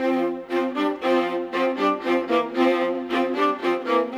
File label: Viola, Orchestral; Sonic Foundry (Sony Creative Software); Orchestral VOL-2; Modern 31